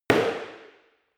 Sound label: impact hard indoors short